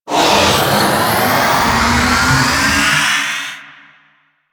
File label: Baby Dragon Split Shot Charge; Enemies; Warframe; Duviri; Void Dragon